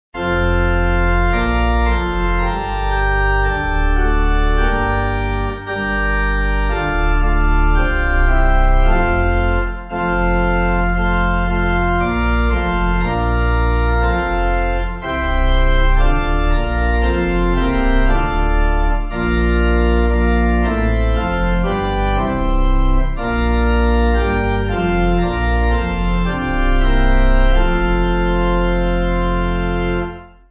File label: Nunc Dimittis Pipe; O Gladsome Light